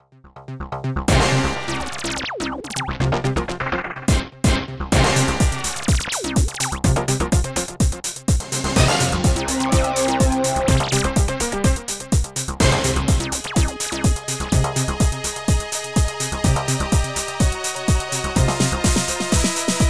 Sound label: shoot em up